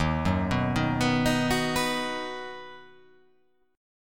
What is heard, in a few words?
D sharp Minor Sharp 5th D sharp down